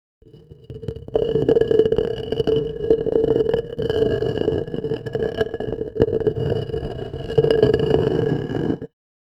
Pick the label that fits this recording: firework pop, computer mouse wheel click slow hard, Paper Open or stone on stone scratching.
stone on stone scratching